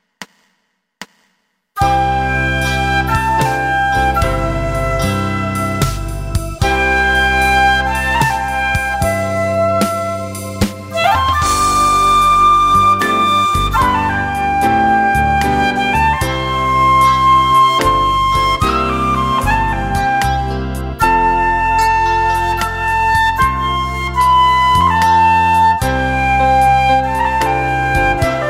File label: Silent Night flute; Nz Flute